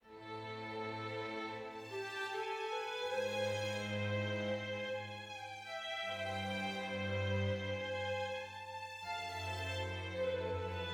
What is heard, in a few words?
sad med